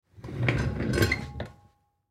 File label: Kitchen Chair, Chair Wheels Push Back Get Up; Chairs; Household; Blastwave